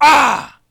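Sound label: hit, male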